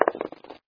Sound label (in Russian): igralnaya kost upala